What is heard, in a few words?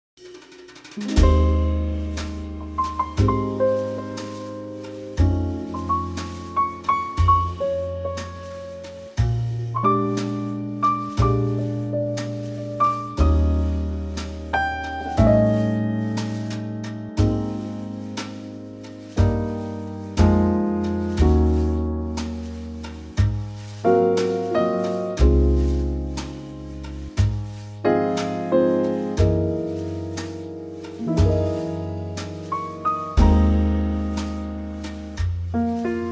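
Trio Ab, backing track; key - Ab - vocal range - E to G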